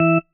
marimba tissue; ゆめ2っき

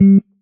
marimba; ゆめ2っき

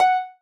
marimba rainbow; ゆめ2っき

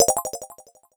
opl2 sfx

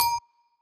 marimba; ゆめ2っき

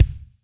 marimba drum; ゆめ2っき

